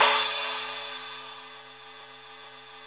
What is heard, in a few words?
Med Crash Cymbal